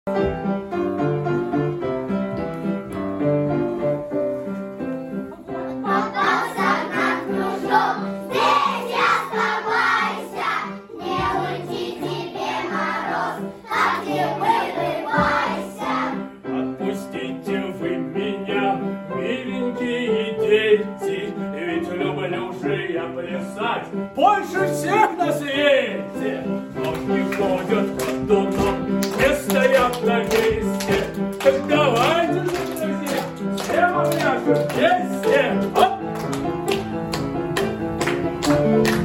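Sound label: song game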